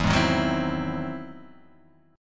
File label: E Augmented Major 9th; strummed; E+M9; JGuitar